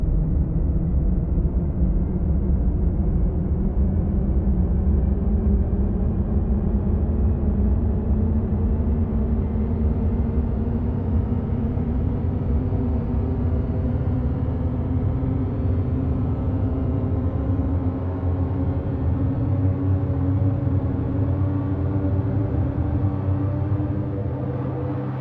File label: noise; december